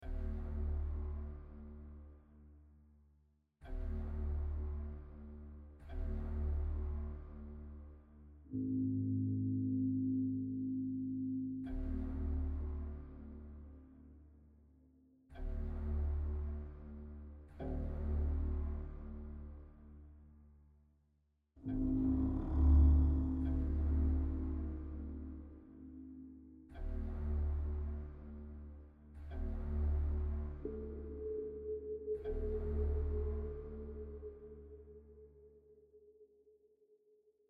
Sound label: Combat Tension Ambient